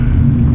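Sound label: ambience; INC demo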